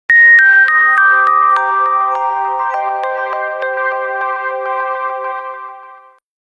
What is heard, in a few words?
sleep alarm, ringtones; Sony Ericsson T650